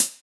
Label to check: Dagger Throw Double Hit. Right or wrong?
Wrong